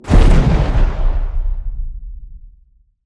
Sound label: EXPL norm; weapons; starruler